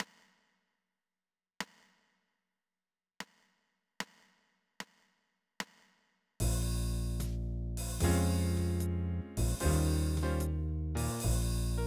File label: mixolydian triplets, background track; mixolydian triplet blues lick; You can play along with the background track to this lick. It's an enjoyable & useful figure.